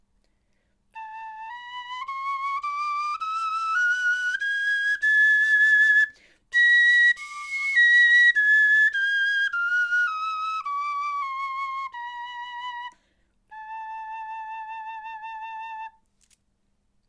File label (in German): tonleiter, knochenfloeten